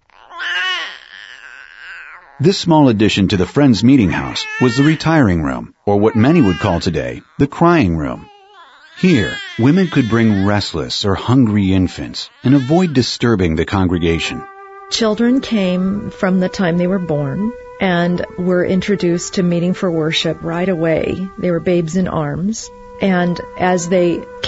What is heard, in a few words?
CHILDREN AT MEETING